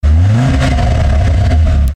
BOV Ford Focus ST

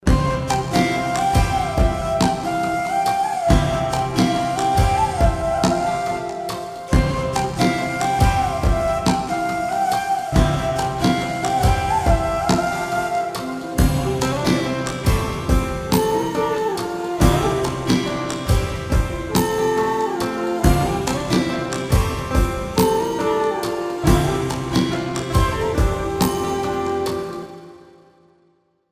flute; nayakan; electronic

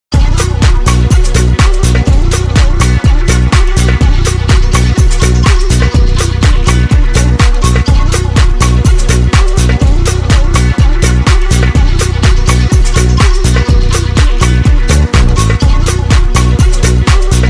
remix

